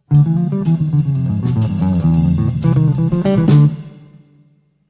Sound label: Melodic Line